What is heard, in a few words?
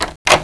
Door